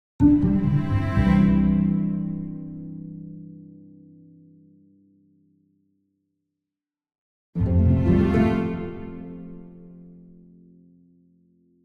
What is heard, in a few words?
Orchestral Jingle Positive